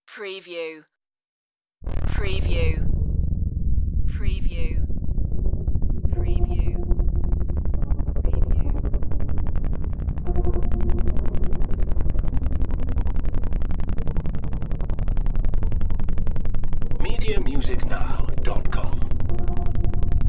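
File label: Deep Movement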